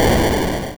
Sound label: door; zelda